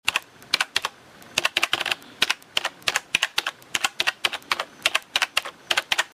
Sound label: Keyboard